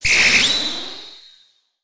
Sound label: Warp